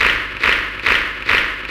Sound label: HANDCRAP LOOP; UFCTapout1; XBOX